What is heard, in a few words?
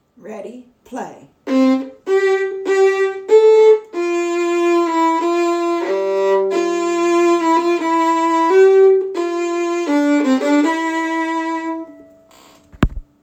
Part B; Lully